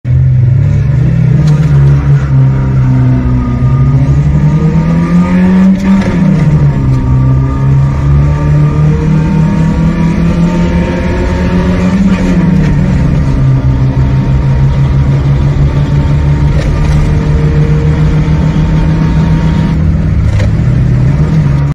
Ram air; کیت مکش

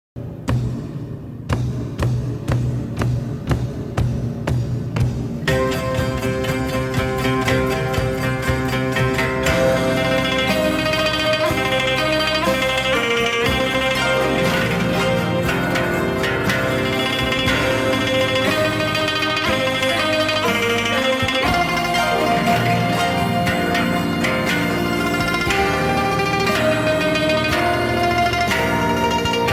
Guqin; گوچین

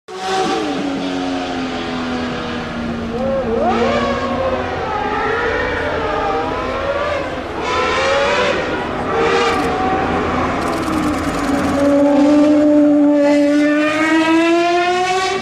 Gordon Murray, اگزوز

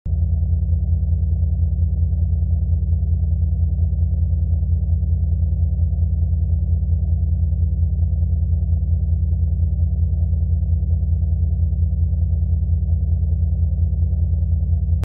Beta waves

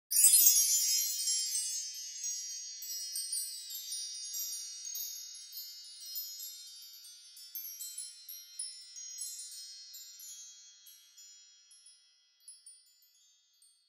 Magic wand; عصای جادویی شعبده بازی

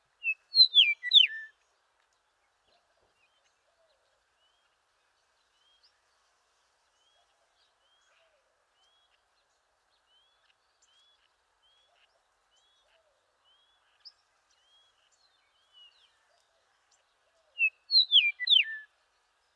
Eastern meadowlark

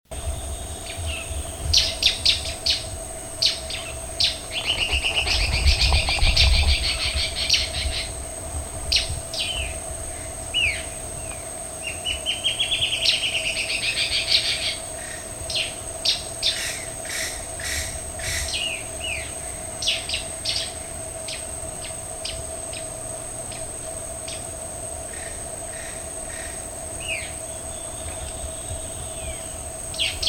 Guianan warbling antbird

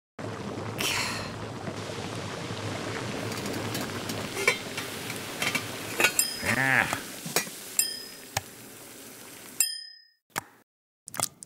Cooking game

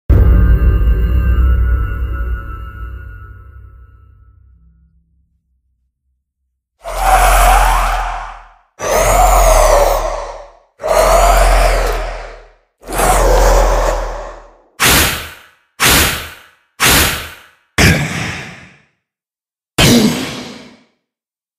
Minecraft Wither

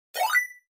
Score points in game, امتیاز گرفتن در بازی

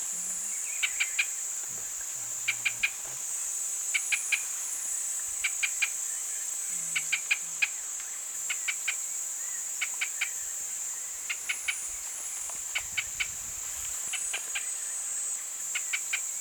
Pinto's spinetail

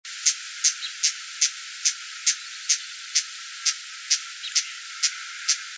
Hyacinth-visorbearer, پرنده آفتابگیر سنبلی

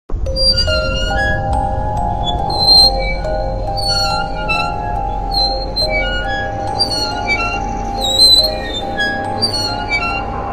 Scary swing movement

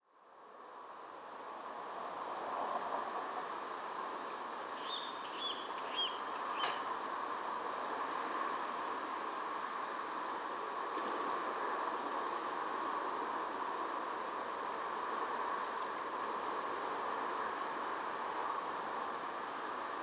Water Rail

